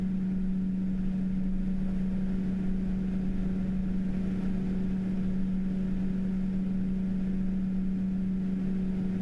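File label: Raijintek Eos 12 1800RPM, fan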